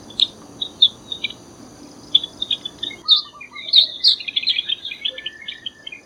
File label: Java Sparrow calls; Tambun Ipoh Perak Malaysia